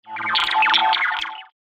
Starfighter System Computer, spacecraft sound effect; star wars / trek style games; Andrew Fleming